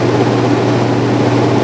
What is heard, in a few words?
Washer; Vehicles